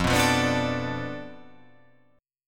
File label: F 6th Flat 5th F down, chord